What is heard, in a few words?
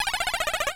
Pain